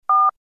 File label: Telephone dial tone